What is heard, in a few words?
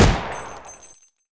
grease gun shoot, weapons; dod 1.3; dod enhancement pack